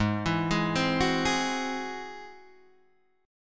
Ab Augmented 7th Ab Guitar Standard; arpeggiated; 4,7,6,5,7,4; Ab+7; JGuitar

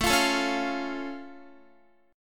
A Augmented A down Guitar; A+ strummed

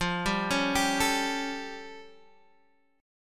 A Augmented 7th F

